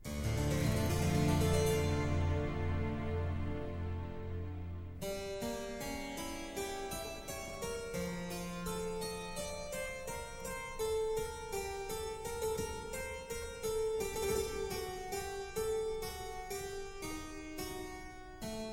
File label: recitative; interview with the vampire lestats